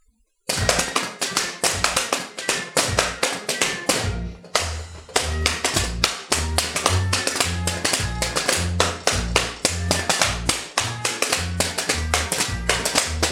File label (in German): Musik